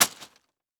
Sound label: Sports Bag Plastic Bag Of Tent Poles Dropping On Dirt